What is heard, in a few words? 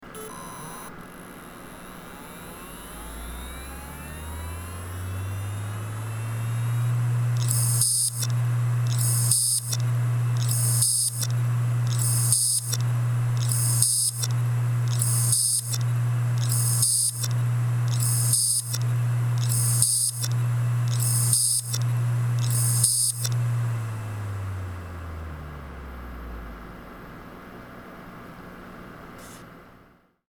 Seagate ST3000DM001 headcrash